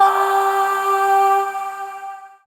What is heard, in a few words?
Void Shawzin Oct Two G; Warframe; Zariman